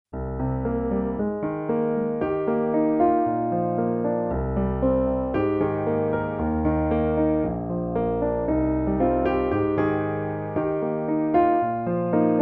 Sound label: piano solo